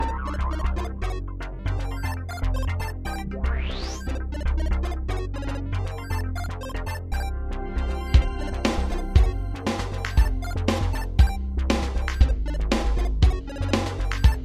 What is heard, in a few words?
Robot; Mushroom Era